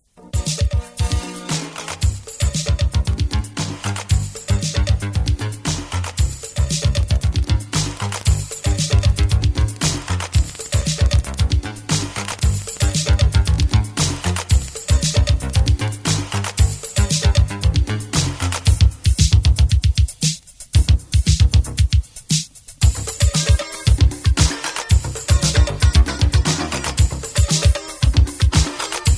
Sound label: Radio 103.6 London Supermix; Skyline Radio